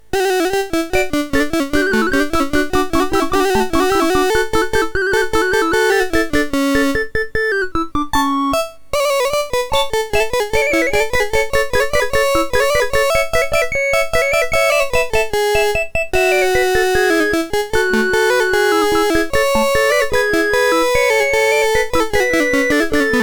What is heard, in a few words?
Canon in Unison, MOS6581R3